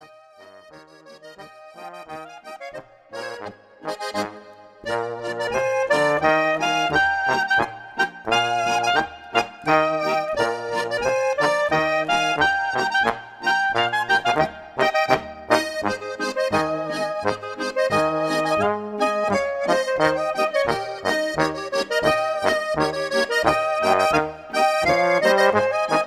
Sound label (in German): Boarischer